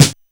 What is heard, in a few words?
Juicy Snare Drum D Key